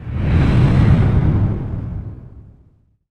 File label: IMP FLAME, Cinematic Impact